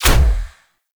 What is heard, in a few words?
Dash Impact; Warframe; Focus; Operators; Operator Wraith Movement